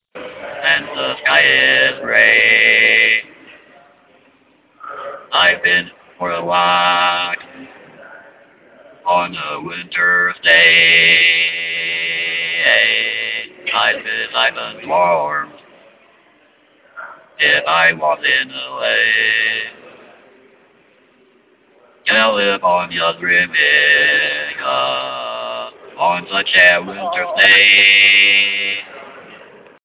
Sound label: Singing PC